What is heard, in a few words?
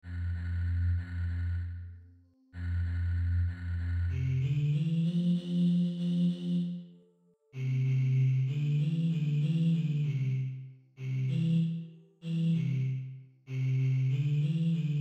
bass